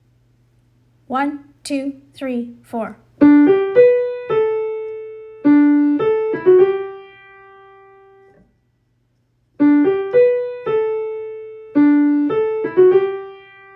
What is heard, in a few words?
clapping